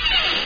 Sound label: open chest; deliantra